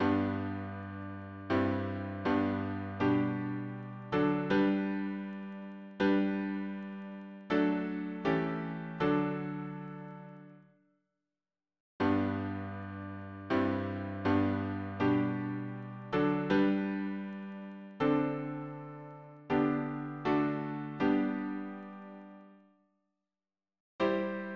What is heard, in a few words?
harmony piano